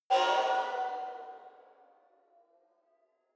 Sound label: sci-fi vehicle turbo activate; raumschiff